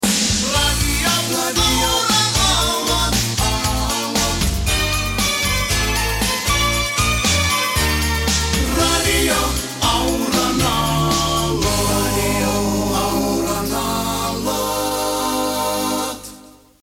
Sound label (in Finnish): jingle laulettu; Radio Auran Aallot